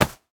wall body; Golden Crayon Sounds

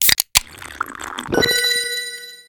ultra potion; Original TK Sounds

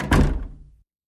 door close; Original TK Sounds

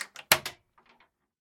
door open; Golden Crayon Sounds

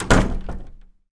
door close; Golden Crayon Sounds

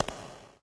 cave grounds step; Golden Crayon Sounds

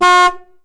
horn; Golden Crayon Sounds

